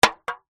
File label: foley drink can cola impact liquid inside